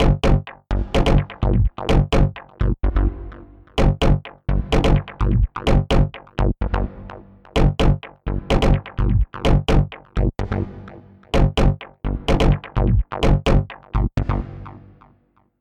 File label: Bassline